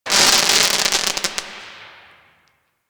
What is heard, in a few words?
Firework Crackle; Fireworks; Warframe